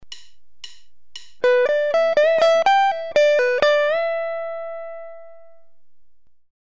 2nd-string bending in a lead lick, guitar

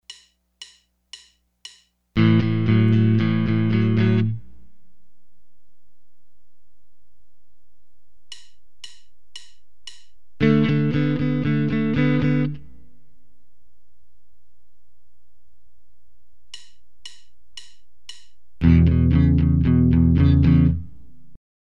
accompaniment riff, guitar

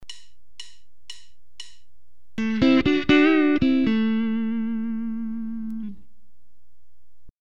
Double-stop bend, guitar

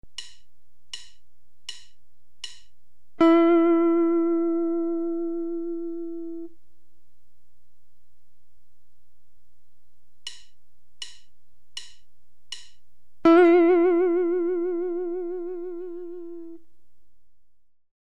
Narrow and wide vibratos, guitar

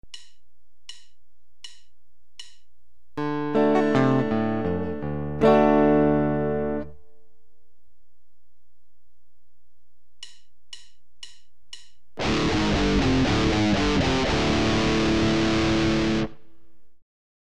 Drop-D tuning, guitar

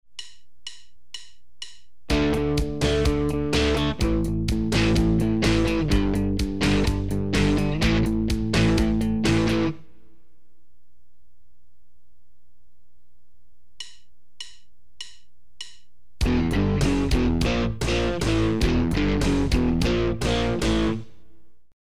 Power chord progression in D, guitar

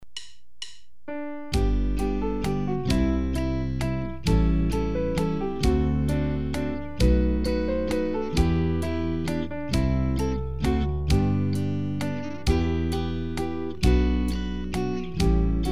We Wish You a Merry Christmas, guitar

